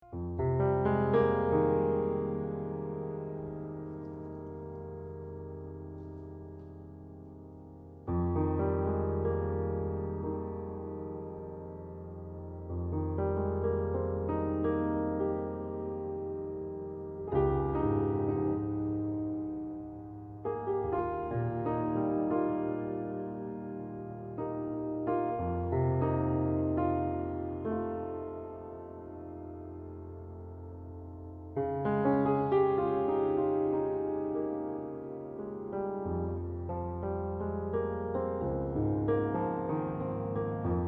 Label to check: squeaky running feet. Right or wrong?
Wrong